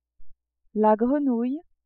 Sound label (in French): grenouille, animaux